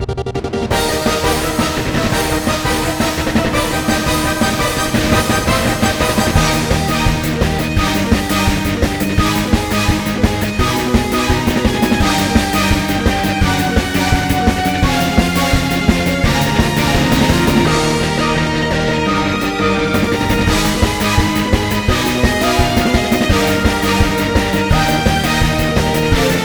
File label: Arena Uptempo